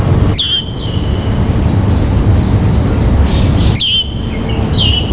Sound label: Aparakee, birdcall